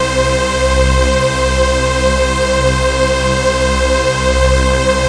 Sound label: d choon, synth